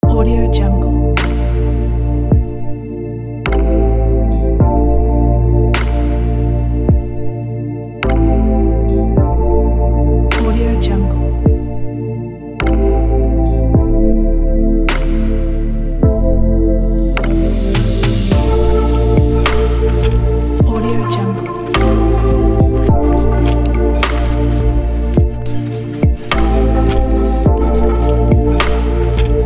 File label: lounge